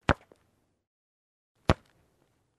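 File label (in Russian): футбол удар по мячу головой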